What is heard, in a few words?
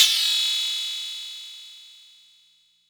Metal